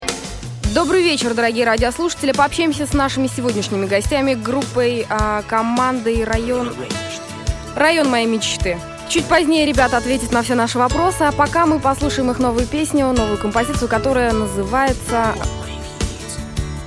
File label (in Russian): (интерлюдия)